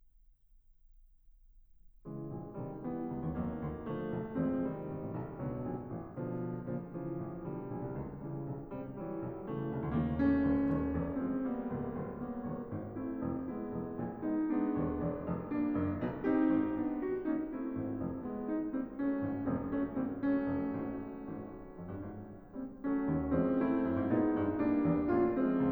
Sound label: Preludes for Piano, Allegro; Martin